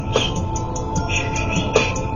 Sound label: whistle rap